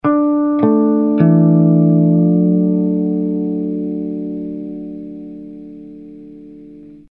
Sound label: COUNTRY; ringtones